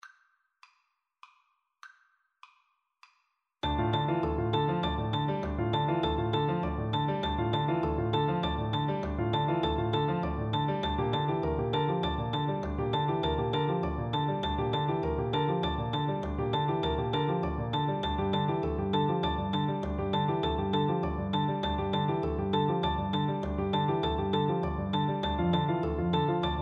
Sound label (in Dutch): Ostinato met metronoom